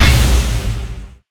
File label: missile; Smite; Zhong Kui